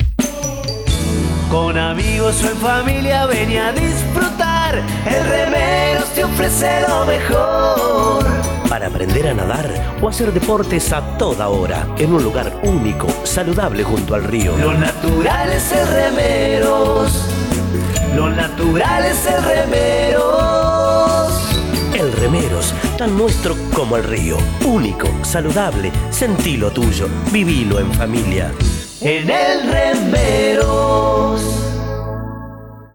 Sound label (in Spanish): REMEROS JINGLE; el club remeros